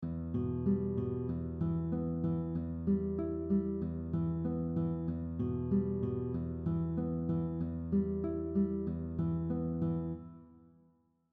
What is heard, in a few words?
Fingerpicking exercise 3 string skipping, guitar; You'll see skips from strings 5 to 3, 4 to 2, and 3